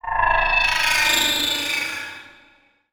Transition Whoosh Warble

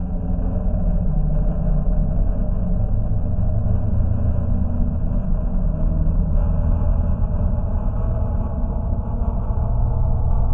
Deep Drone; Cinematic Trailer Music; Bonus - FX & Transitions; BlueFX

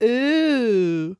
Human Male Ooh Disgusted Ooh

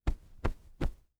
Cloth Fluff Pillow; household

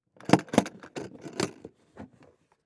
Industry Tool Box Open Lid

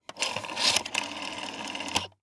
Household Phone Dial Rotary Nine

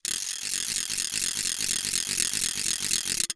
Foley Toy Noisemaker Click

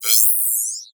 Multimedia Internet CD-Rom Flash Wipe Electronic

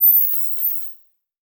Title Transition Digital; Adobe; Production Element

